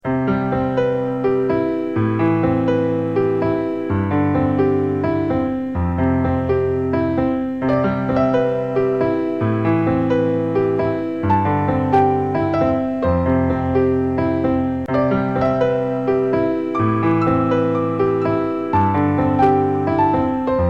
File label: Piano